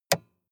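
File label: sports car interior switch on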